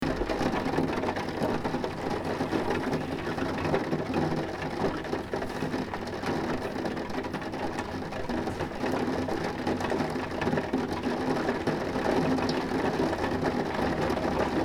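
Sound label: rain on the window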